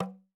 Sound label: TAM TAM, African Drums